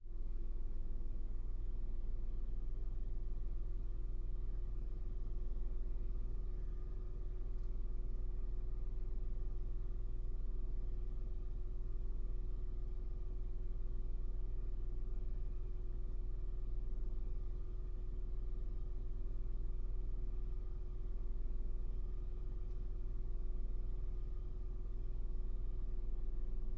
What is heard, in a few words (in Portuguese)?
Ambiencia Interna de Carro com Motor Ligado